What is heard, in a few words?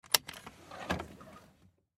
Seat Belt Action Off Movement; Transportation Automobile; Car